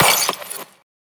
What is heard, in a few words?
Glass Break; FREE SFX PACK VOL 1